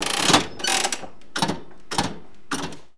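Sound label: casino ambience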